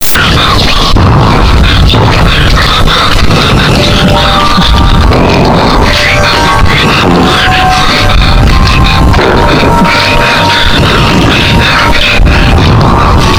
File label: gassy; Viral Soundboard